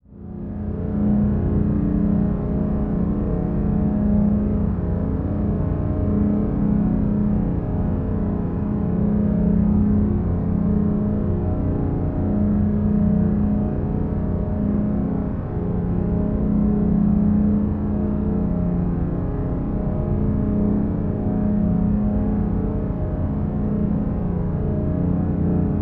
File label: Drone Keyboard Synth Tone Steady Filtered; Production Element Imaging; AdobeCC